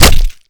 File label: Projectile Sounds; Red Orchestra Ostfront 41-45